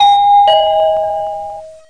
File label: chime